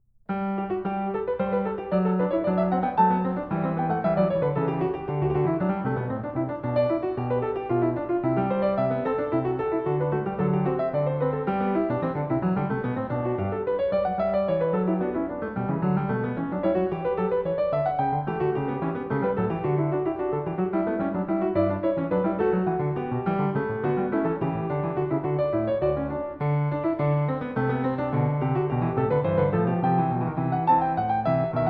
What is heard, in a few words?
Binaural; Goldberg Variations; Variation 12 Canone alla Quarta; Bach; Zenph Re-performance; BWV 988